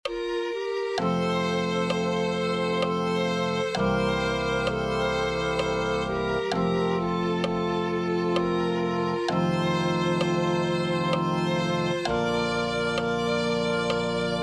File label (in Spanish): dame ojos violin